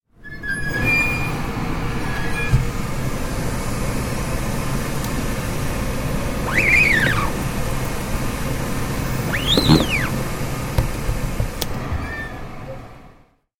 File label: screen printing